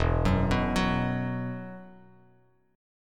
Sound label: E Suspended 2nd E, down, Bass Standard